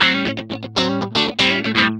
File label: GUI, LICKS; 120 LICKS